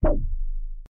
SciFi Button

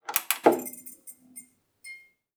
LIGHT Button Fluorescent On mono; Don't Be Afraid The First Toy

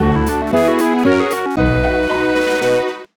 Victory In Contest Jingle; My Horse & Me 2 Riding for Gold; nds

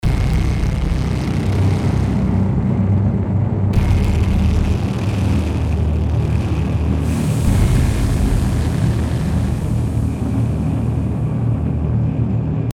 Hush

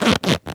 Leather

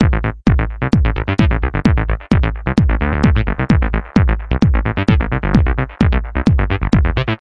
Techno; Learn to Fly 2

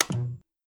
Panel Divert Power Switch; Among Us

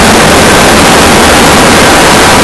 Turbine; Learn to Fly 3

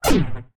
Orb Lose; Smashy Road Arena android ios 2016

